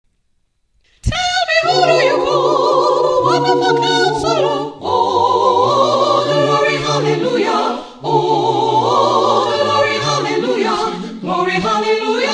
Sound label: SATB rehearsal